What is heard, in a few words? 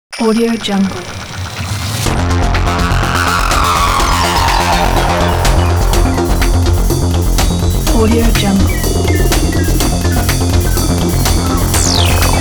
Aggressive Tech Logo